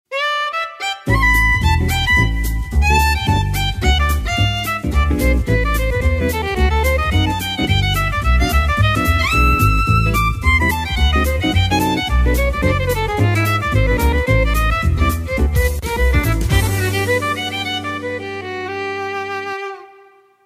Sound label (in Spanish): Jazz Clasico